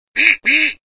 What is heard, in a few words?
Launch Duck; Angry Duck Cannon 1.5